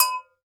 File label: GANKOKWE, African Percussion